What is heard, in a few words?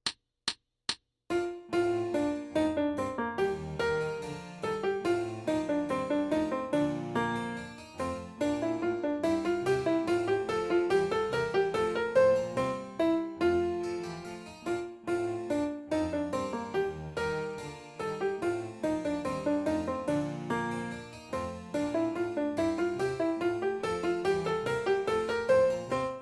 Bourree Full Tempo with Solo, Alto Sax; Solo Accompaniments; Handel-Andante and Bourree